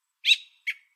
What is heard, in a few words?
bird, ambient animal